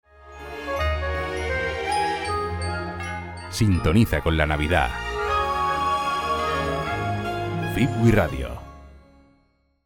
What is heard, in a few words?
jingle radio; fibwi radio